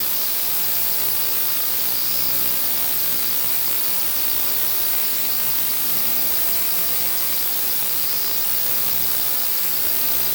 glitched tones AM Radio Interference

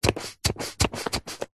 household sunscreen bottle squeezes empty; zapsplat

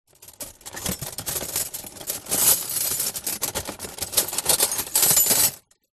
household cutlery in cardboard box movement

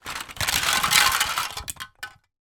toy marble run tracks lose pour out of box

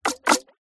game designed water hit thump

